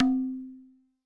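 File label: TABLA right; WorldSounds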